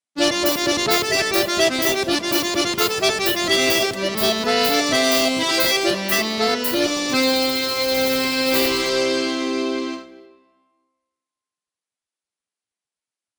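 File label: Russian folk song, backing track; vot zadumal komarik zhenit sya; shutochnaya pesnya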